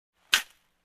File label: Cutter closing